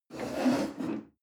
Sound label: chair wood take slide medium